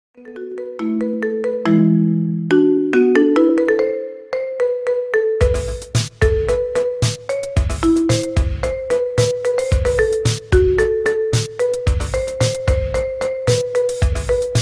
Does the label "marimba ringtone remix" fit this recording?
Yes